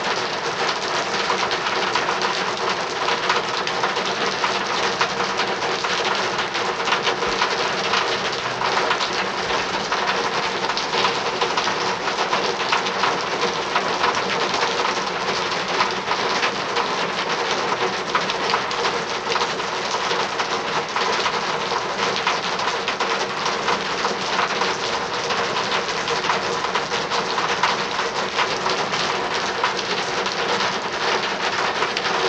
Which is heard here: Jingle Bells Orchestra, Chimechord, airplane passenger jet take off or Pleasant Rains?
Pleasant Rains